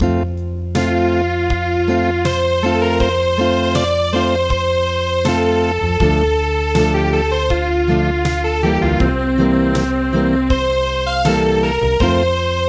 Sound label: Acoustic